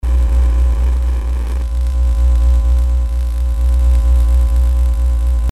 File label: electricity sparks loop